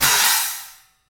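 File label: cymbal, Trash Tuned; Roland L-CD701; Trash+Kitch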